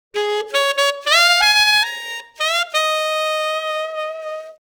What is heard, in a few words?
sax